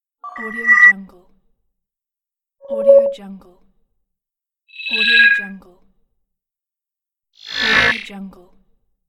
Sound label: expand area hud ui